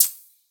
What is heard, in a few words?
Hihat, Dubstep